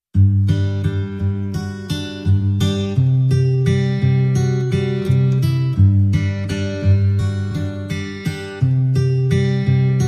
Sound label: Acoustic Guitar Only